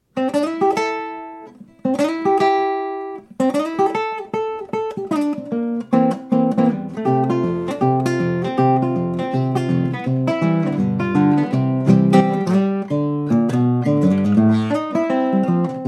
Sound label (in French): Guitar Shuffle Enregistrement DAT de salon Pas deffets sonores; par Silvestre Borras